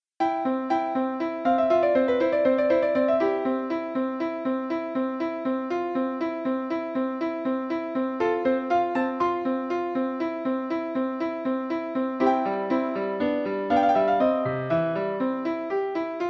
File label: Piano; Mozart, Wolfgang Amadeus - K 330 - Sonate N° 13